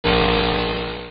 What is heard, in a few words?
Motorbike loud GO